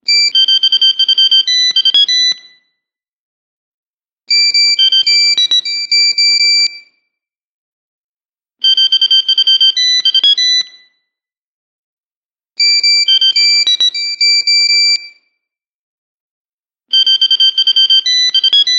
Cell Sound Nokia

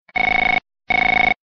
Electrical Beeps

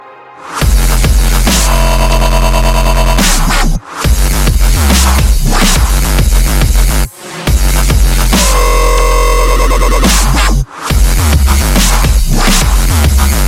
piano bar; ringtones

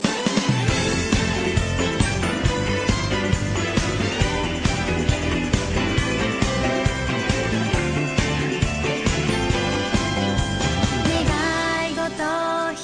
8 bit; nazo no kanojo

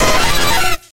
Zapdos Cry

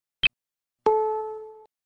Radio Chirp Tone

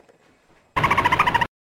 SFX, ringtones

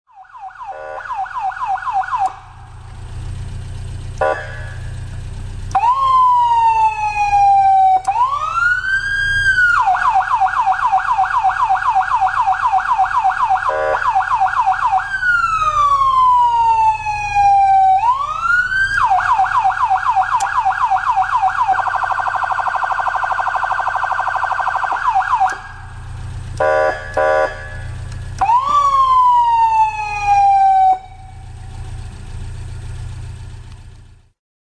federal signal mini siren ms4000

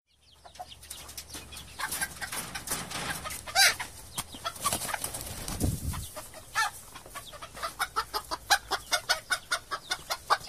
CHICKEN SOUND, ringtones

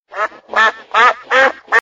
Duck Sound

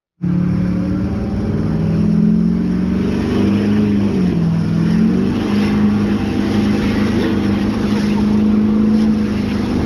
Duramax Burnout

